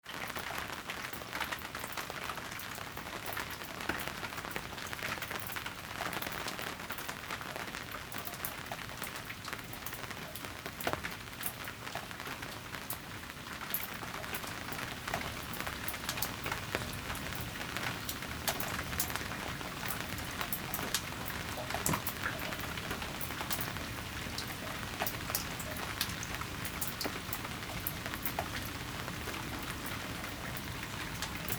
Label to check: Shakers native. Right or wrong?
Wrong